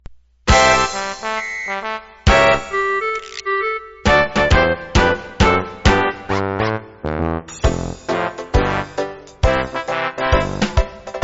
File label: Instrumental; sunday school praise kids